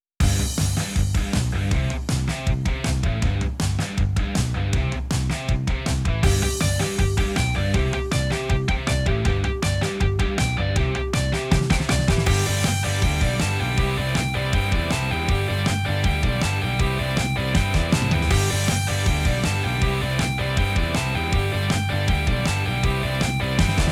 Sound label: rock